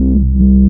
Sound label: combat scan loop, scanner; Source Engine; video game; npc